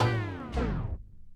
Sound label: Turntablism; Sonokinetic - The Roots Drumloops